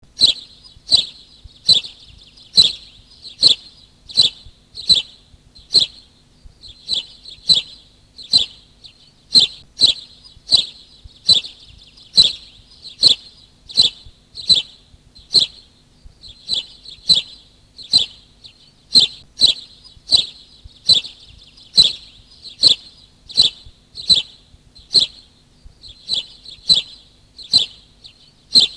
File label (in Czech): Vrabec polni